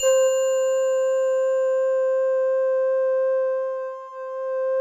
C5, Synth Line; 90 s Sample CDs; NiceBeats; SynthLine VOL-32

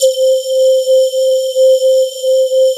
bottle C4; BOTTLEMAN; Synth

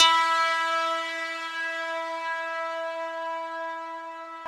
RESO METAL 2 E4, Yamaha VP-1; Music Store

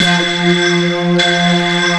ATMO SYNTH

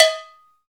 CowBells

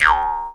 jaw, Didgeridoo and Other Primitive Instruments

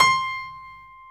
C5 STR PIA, G.PIANO; AKAI S-Series CD-ROM Sound Library VOL-7

